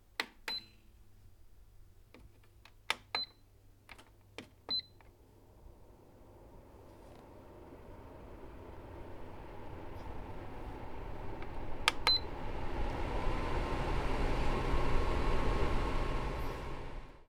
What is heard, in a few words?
air purifier with beeps